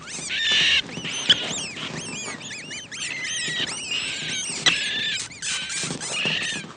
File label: Rats; Inquisitor 2